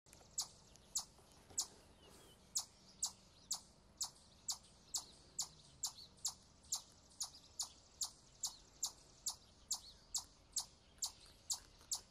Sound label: Siberian chipmunk; سنجابچه سیبری